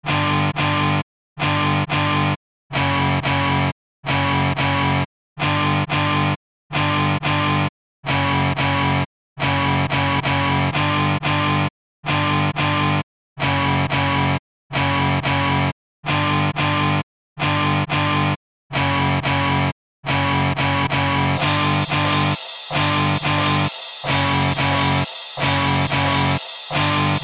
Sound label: analog punk noise rock